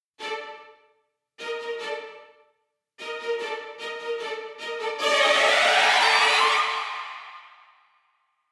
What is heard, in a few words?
Combat In; The Witcher 3 Wild Hunt; Skellige